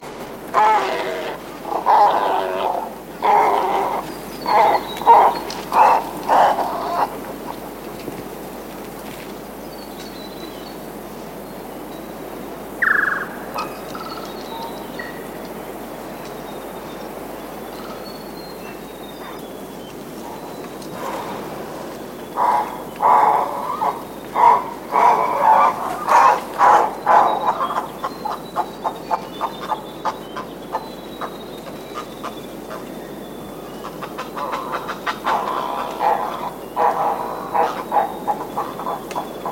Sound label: Royal Spoonbill